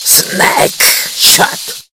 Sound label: shoot